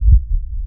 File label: heartbeat